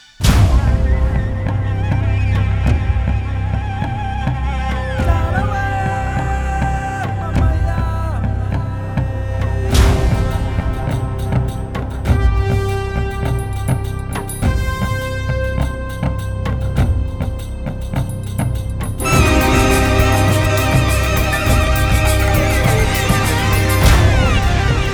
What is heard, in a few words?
Instrumental